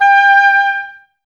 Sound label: OBOES G, Oboes, Wood Winds; Miroslav Vitous - Woodwind Brass Ensemble